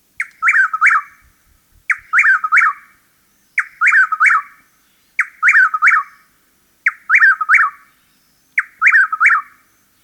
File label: Chuck wills widow